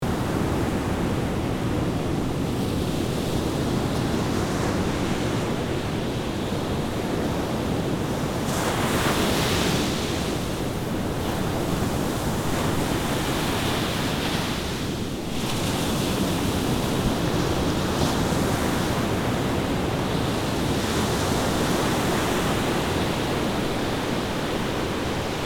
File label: Strong sea wind and waves ambience; ocean